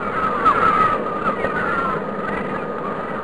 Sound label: seagulls; U-Boat 1.5.3